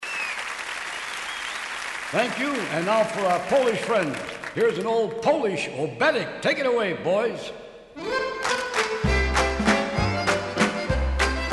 Oberek, LIVE; Nashville

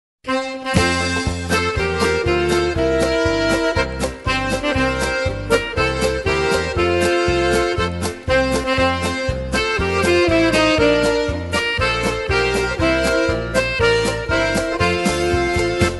Polka

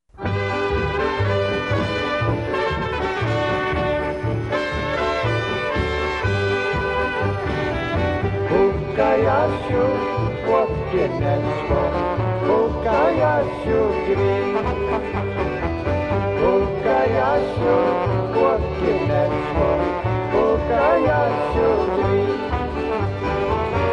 Polka

